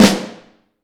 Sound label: Vintage Snare Drum; Q Up Arts; Johnny.C's Vintage Snare Drum; BLK BEAUT